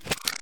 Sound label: Lever On